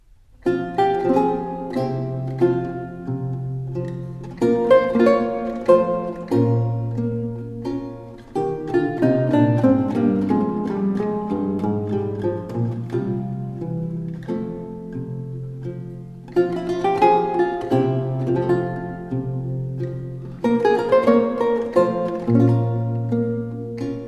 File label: Gagliarda, Lute